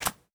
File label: Green, Golf; Foley Sports